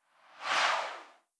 UI FX BG Scroll; blue archive

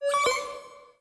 UI Dice Race Plus; blue archive

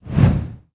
Wind; blue archive

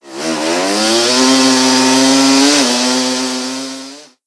Vehicle Engine; blue archive

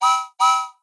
Emoticon Motion Steam; blue archive

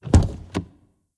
Vehicle Open; blue archive

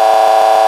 horn, sirens; galls street thunder 800

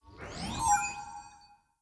Dice Race Result; blue archive

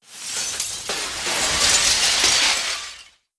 Clink; blue archive

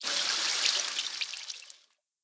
Hose Water; blue archive

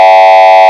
horn, sirens; code3 3955

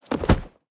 Fall; blue archive

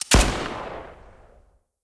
Shot; blue archive

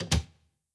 Door Close; blue archive

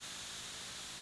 Noise; blue archive

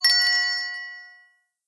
Chime; blue archive

